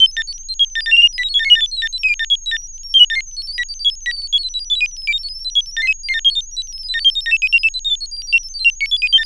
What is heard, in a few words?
S H VCO, Analogon